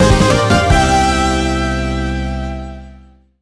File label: Treasure Chest Opened!; Kirby and the Rainbow Curse; wii u